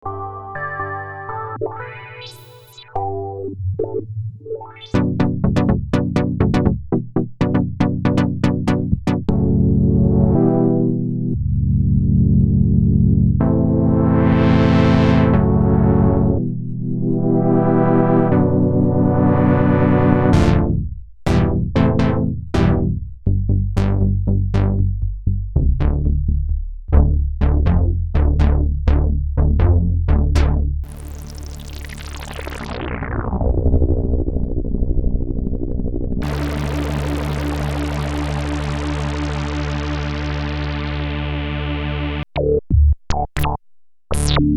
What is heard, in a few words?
alpha juno; roland